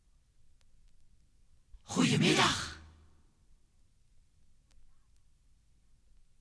topformat maxi jingle single

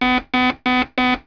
klaxon loop

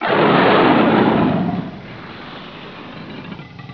GRUNT

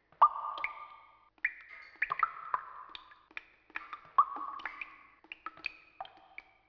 drip echo loop

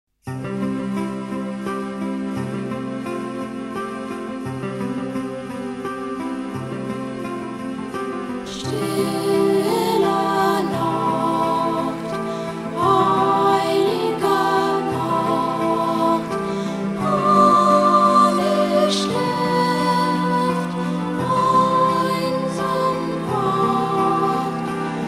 Stille Nacht (Sung German); Focus Music

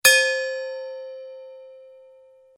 PAUSE CLOCK; Rosegarden

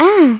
AAH

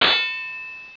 SWORD

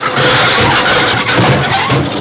crash metal scrape

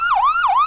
SIREN

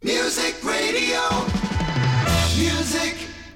topformat maxi jingle single

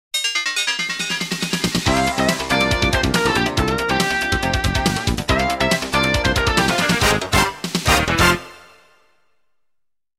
Station Call, Sounders, Bumpers; Sounders, Bumpers, CUES & IDENTS; DennisMusicLibrary

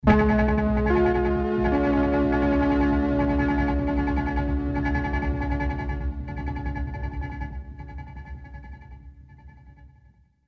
News Sounder; NBC Radio News

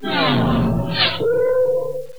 speeder bike; endor; Star Wars

